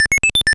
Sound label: LEVEL UP; Tetris 11